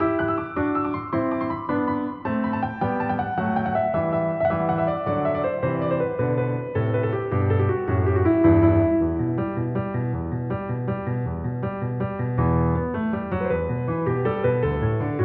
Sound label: piano